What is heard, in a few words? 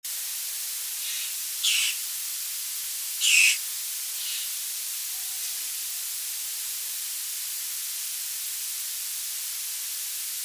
Apostlebird Raven; NSW